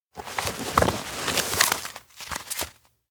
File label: bag takeout memo; anemoi